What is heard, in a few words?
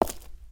Footstep Stone Outside